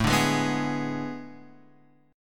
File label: A Major 7th Suspended 2nd Suspended 4th, Guitar, Open F; AM7sus2sus4 chord; strummed; JGuitar